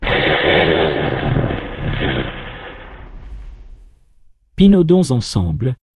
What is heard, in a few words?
jingle